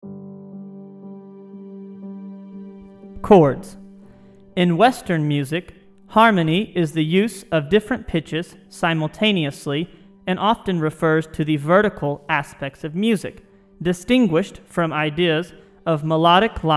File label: CHORDS; intervals and chords